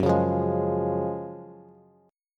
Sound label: Gb Major 7th Suspended 2nd, Bass; GbM7sus2 strummed; JGuitar